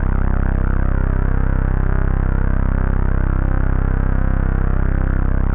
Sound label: 505, String Machines